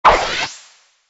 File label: powertie throw; Toontown Online